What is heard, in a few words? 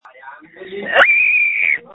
screams